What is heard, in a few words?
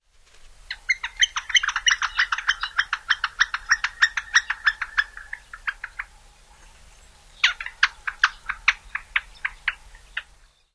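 interaction calls red-shafted, bird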